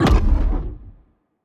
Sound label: laser hit, weapons; fire rate; Stellaris; biogenesis